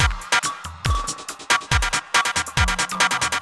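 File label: industrial 140 kit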